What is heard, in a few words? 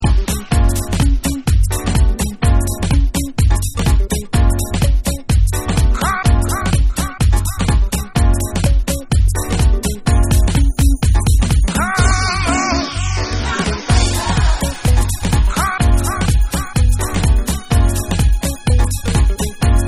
Dub Mix